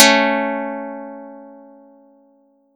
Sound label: pluck; Audacity; 4 12